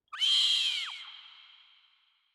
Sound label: falcon